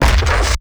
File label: giant heavy step; Garry's Mod; tf2; mvm